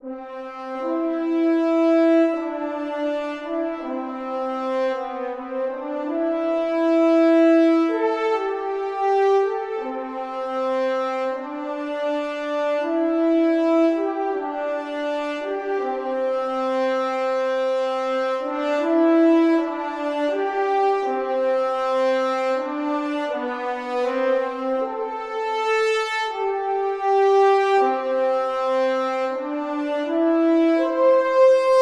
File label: horn; Горн — сэмпл для драматической сцены; Кино, Саундтреки